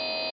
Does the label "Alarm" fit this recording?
Yes